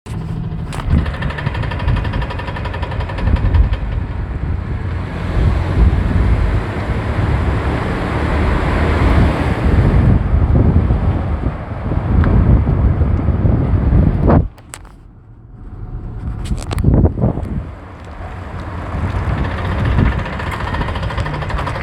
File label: dodge ram grinding going into 2wd voice; 2nd gen ram